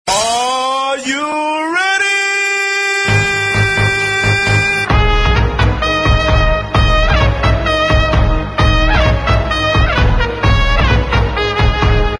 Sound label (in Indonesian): ready terompet